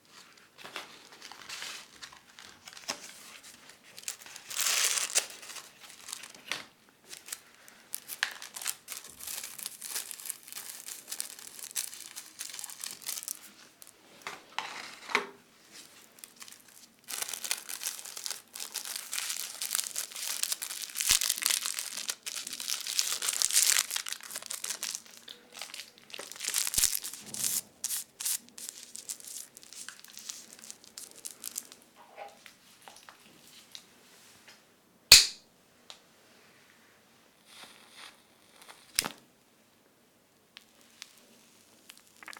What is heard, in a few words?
Cigarett Roll N Light